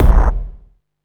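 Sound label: MISC Right, Techno 138 BPM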